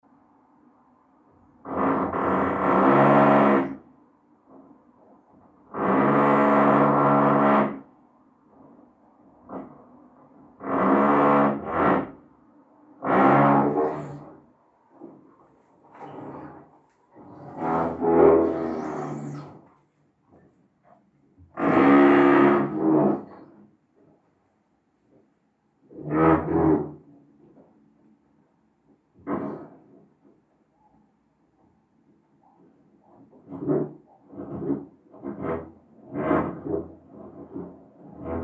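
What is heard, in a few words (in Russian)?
соседи сверлят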